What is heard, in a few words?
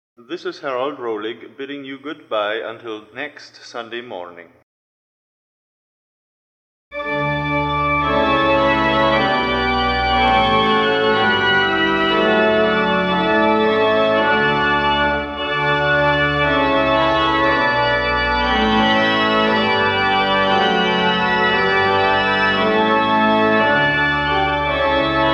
Improvisation; Now Praise the Soul My Lord